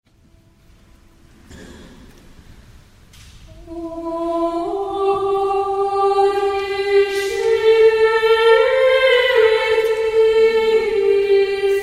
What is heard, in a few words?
Introitus; Musica Sacra; Hodie Scietis